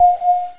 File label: message; hurrican